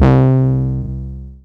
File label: BASSIC, SYNTH FUNK; Zero-G; Funky Elements